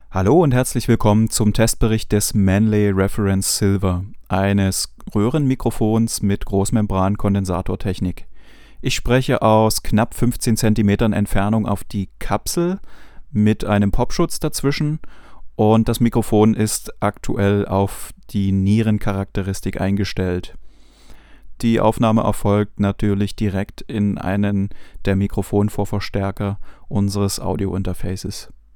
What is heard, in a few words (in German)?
Manley Reference Silver vocal, Niere